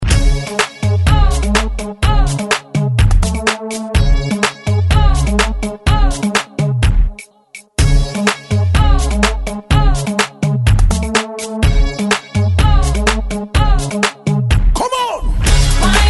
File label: Whine